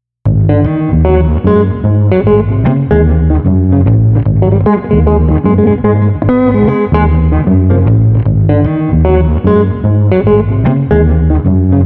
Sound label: Guitar Bass Jazz Funk 120